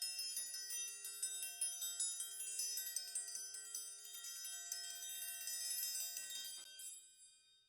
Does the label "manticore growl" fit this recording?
No